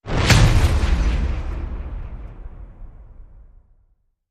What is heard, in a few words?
Hit Injection